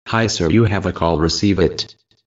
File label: Caller Tune